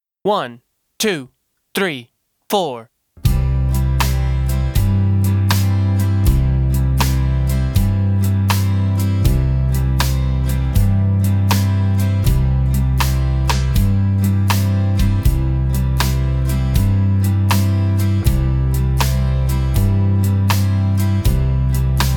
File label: Single Chord Vamps Slow Country Shuffle C